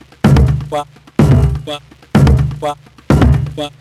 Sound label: tribal perc 126 stomper; Wave Alchemy Loop Tools and Grooves